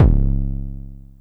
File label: BD MOD Overdrive Low, bass drum, 909; classic machines; 909mod